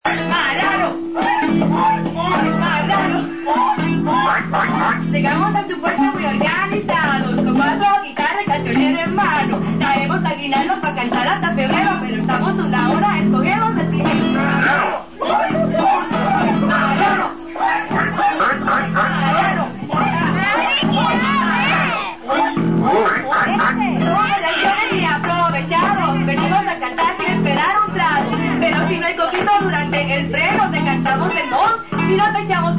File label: rap; Navidades 89